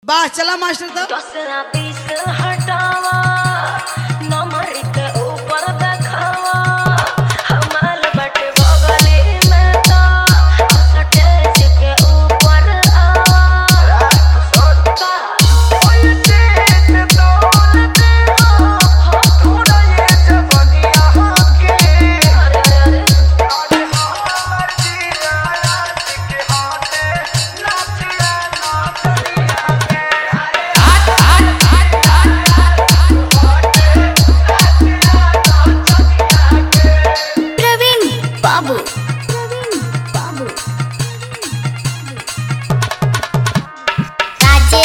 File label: New Bhojpuri Song Bass Mix